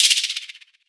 slowmo, Hats Shaker

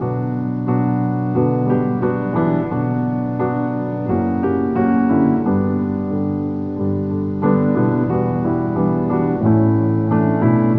piano, Loops, Sad

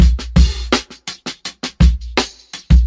funked out, Boombap drum loops

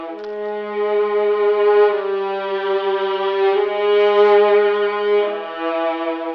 strings, Sad

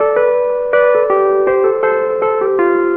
piano, Loops; Phonky

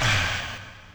breath

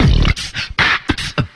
brrp, Beatbox; Drum loops

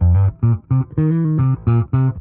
bass, Loops Phonky

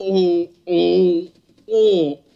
"oh oh oh" in pain; Voice

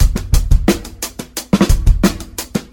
Drum loops Boombap

